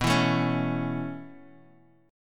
B 7th Suspended 2nd Suspended 4th B down Guitar Standard; strummed; capo=2